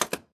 button Click; jungle spirit

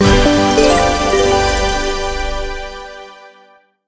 medium Win; dazzle

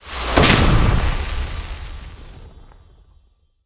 smallwin; goldentavern

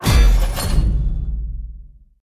Landing In Jackpot; mercy of the gods

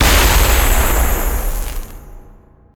big win attention; eggomatic

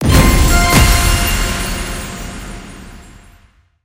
medium Win; gonzos gold

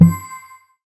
avalanche Thud; dazzle

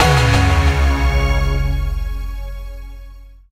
expanding Wild Tonal 7up; hotline2

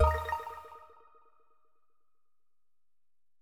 quick Stop; jewel fruits

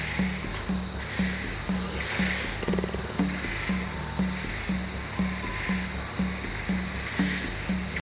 splash Free Spin; warlords

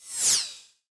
Butter Fly Summon; jungle spirit

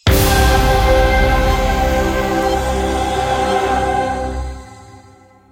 big Win End; goldengrimoire

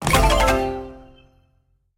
explode wild multi; serengeti kings

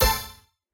scatter short; spinsane

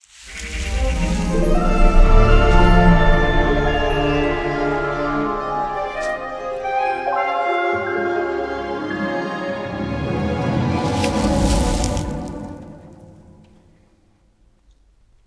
intro Bonus Game; fairyhansel

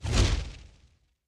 near Win Flame; warlords

